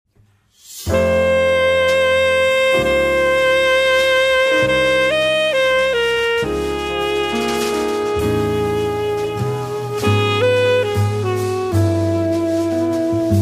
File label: waltz